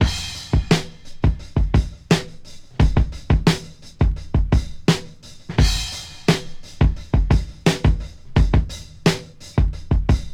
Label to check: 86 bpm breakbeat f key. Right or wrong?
Right